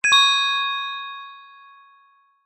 fantasy magic chime ping wand fairy godmother

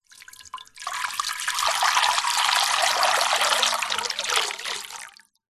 foley water pour into metal container

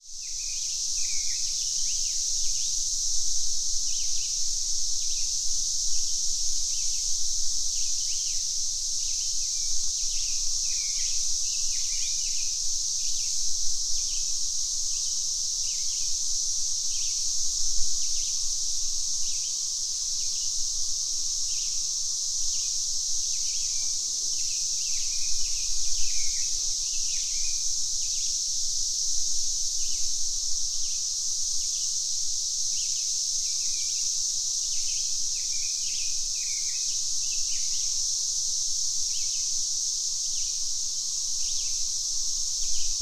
nature ambience ciada birds midwest usa

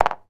die place on playing board

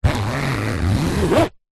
laptop case fabric pouch zipper; foley

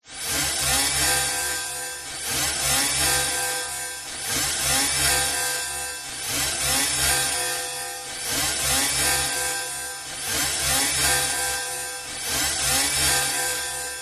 science fiction alarm nuclear radiation emergency; zapsplat

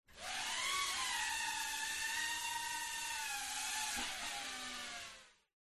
Drone; fox audio

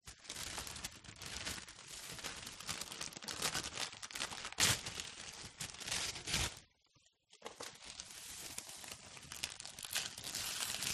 baking paper foley tear

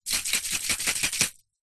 industrial compressed air canister shake

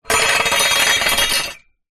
household rake metal drag on concrete short

